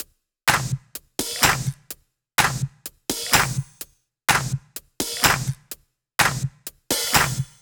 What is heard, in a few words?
126BPM Cubic Kit; Vengeance Samples